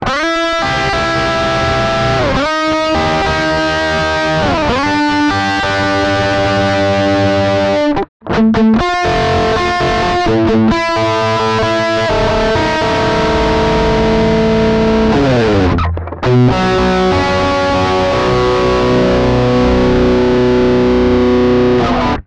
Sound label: Intervals; sansamp